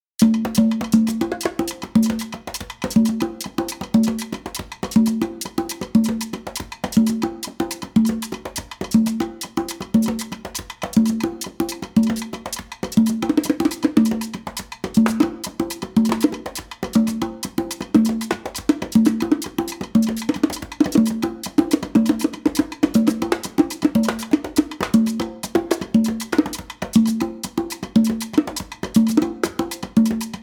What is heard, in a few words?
Rumba